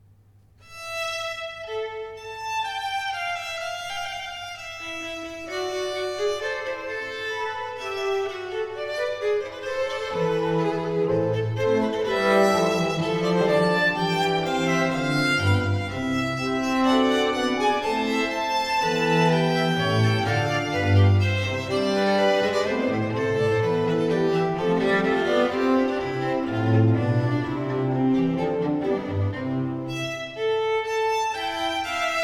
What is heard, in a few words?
Sonate 4 in B minor - 2. Vivace; Arcangelo Corelli; Corelli - Complete Works; Klassika